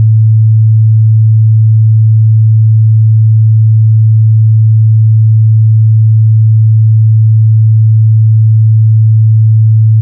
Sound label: 110 Sine wave